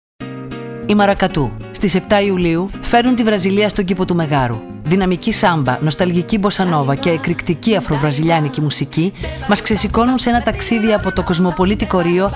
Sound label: MARACATU